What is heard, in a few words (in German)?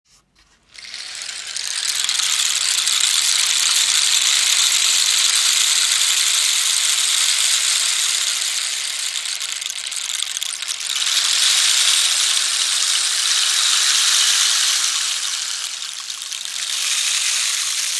regenmacher; das an fließendes Wasser bzw. an das Rauschen dicker Regentropfen erinnert; Klangbeispiel eines Regenmachers